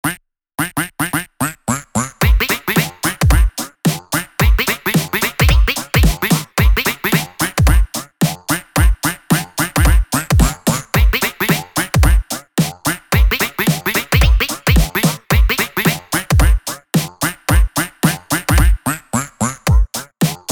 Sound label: Quack Song; gmod